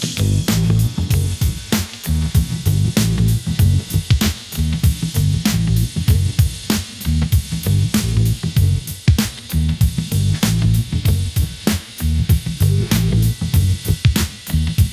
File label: funk drop groove; ai